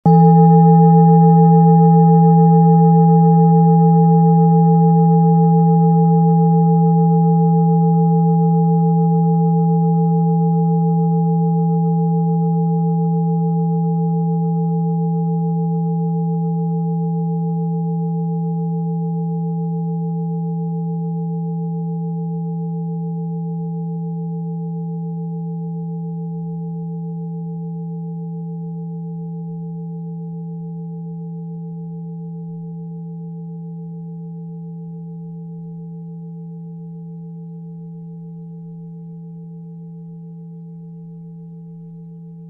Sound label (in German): klangschale messing